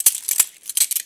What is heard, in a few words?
Handcuff Cuff Hands; SFX Kit; Foley - Misc